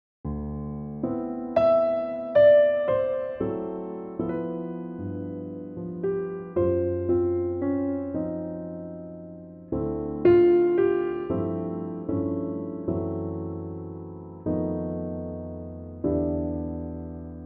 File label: C piano; key - C - vocal range - C to E